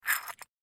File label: Christmas Figurine Ornament Pick Up Bell; Foley Christmas Figurine Ornament Movement Scrape Bell Holiday; Blastwave